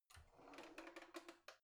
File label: old drawer creaky soft half out